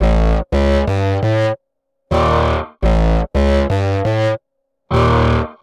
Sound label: Metahedz Bass; Sonic Foundry (Sony Creative Software); Knutrix Drum & Bass